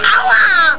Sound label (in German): AUA